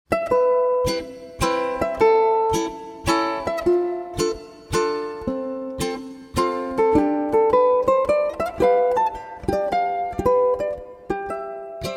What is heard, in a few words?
Ukulele; Dragon Quest Ukulele Collection